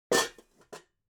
object kitchen pots stack

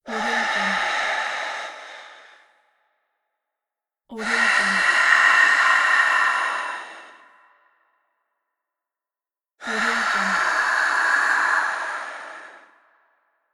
exhalation

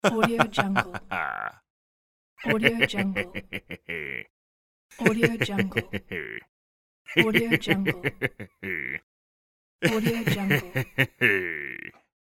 male malicious laugh

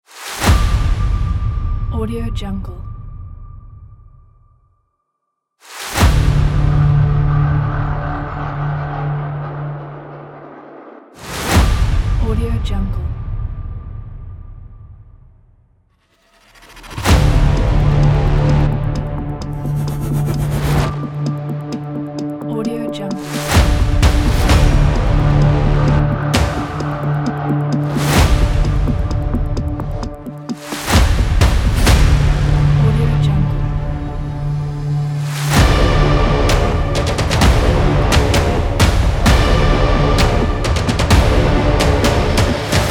the trailer

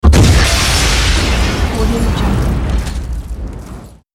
the explosion

